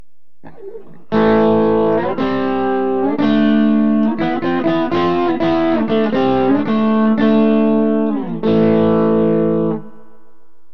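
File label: brobucker bridge, guitar pickup; burny; fugly clips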